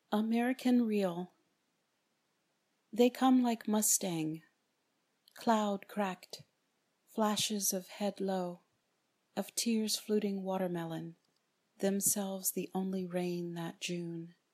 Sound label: american reel